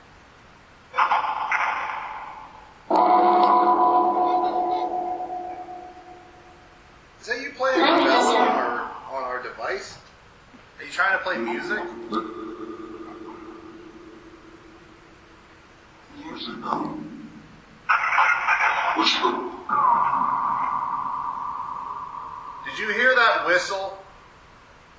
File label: Auditorium Phasma Box; beaver area high school investigation